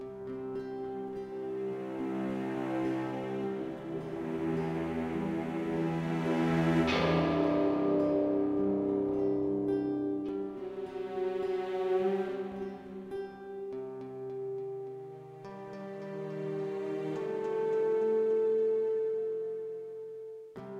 Castle Loop; assassin s creed chronicles india; E02 Herat

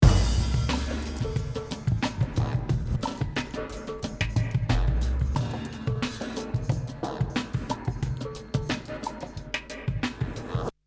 Rhythm; PixelJunk Shooter 2; ps3

